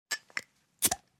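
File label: opener touches and smacking sound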